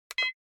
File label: Camera Compact Button